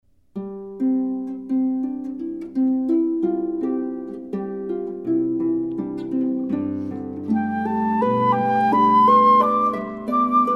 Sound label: harp